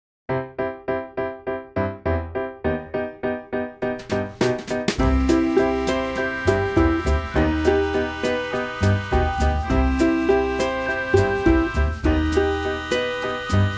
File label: Short Quirky Ending